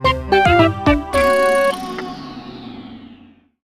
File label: Star Shuttle (Fail); Wii Play Motion